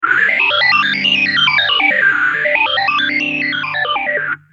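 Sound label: guitar boss ph3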